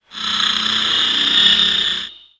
Marker Tag Upload; moul-assets

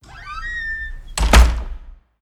Office Door Close; Slave; moul

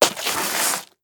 Stone Turn

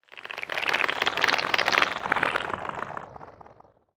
Creak

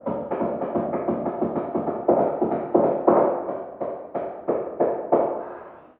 Random Double Hammers; moul

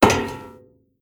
Metal Walk

